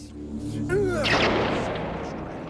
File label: punch; Drago punch demo